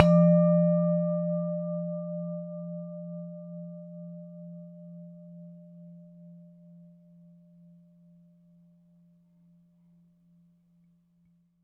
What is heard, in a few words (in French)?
couvercle; In the kitchen; 96khz 24b mono